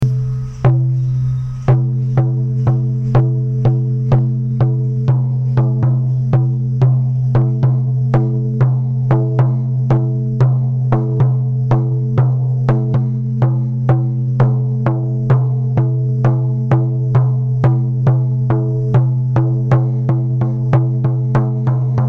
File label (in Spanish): tambor 33cm; estrella